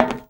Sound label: walk metal, FootStep; WarRock